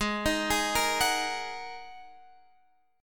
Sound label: G sharp 7th Suspended 2nd G sharp Guitar Drop D